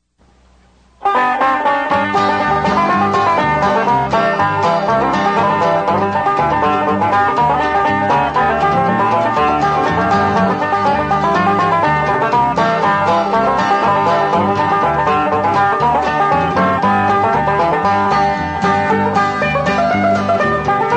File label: banjo